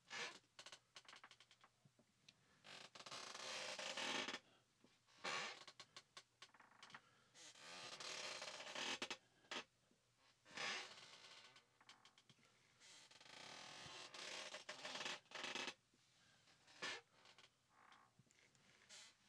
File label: Creaky Chair w Breath